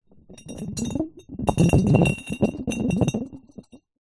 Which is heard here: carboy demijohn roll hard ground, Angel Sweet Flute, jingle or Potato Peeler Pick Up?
carboy demijohn roll hard ground